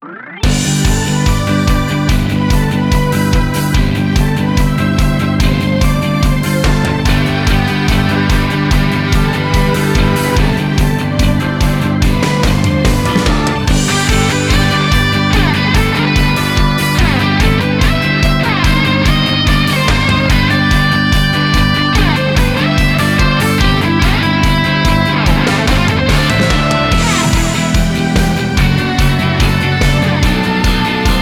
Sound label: BGM Event Battle; Kenka Banchou 6 Soul Blood; 3DS